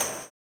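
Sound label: tambourine, 160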